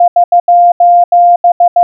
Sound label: sos, morse code